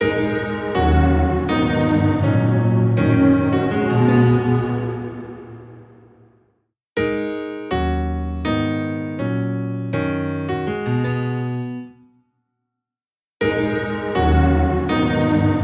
Piano Ethereal Piano Recital Binaural; MicroPitch Immersive

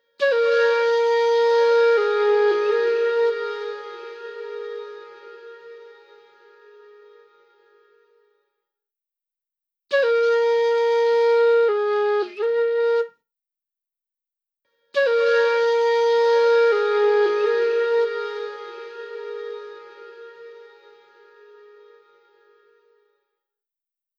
Flute Circular Silhouette Binaural; Blackhole Immersive

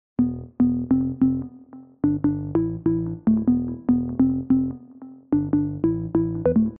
bs sub; オルガンベースっぽいもの; Synth Basses1; RawSy Bass; Waves Renaissance Bassでローを増幅; オルガンベースっぽいものをSerumでレイヤーしています

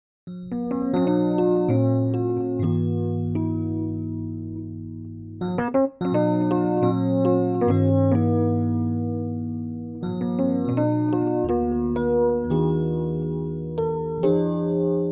EP Bell; dexibell vivo s2

